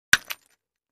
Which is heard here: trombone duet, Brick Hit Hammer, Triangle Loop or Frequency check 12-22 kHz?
Brick Hit Hammer